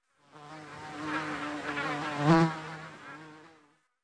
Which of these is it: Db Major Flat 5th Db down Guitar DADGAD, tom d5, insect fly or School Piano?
insect fly